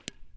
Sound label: splat block; splats